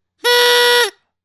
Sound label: Party Favor; Soundzabound